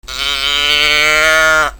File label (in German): maeh